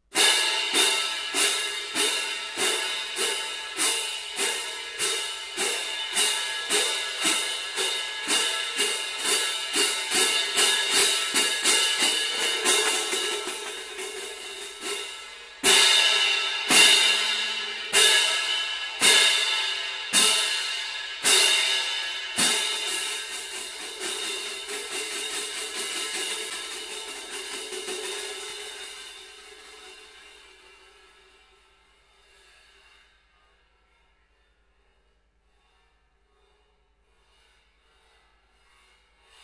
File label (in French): cymbales